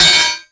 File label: bouncy metal beam hit; bouncy objects; Tony Hawk's Project 8; XBOX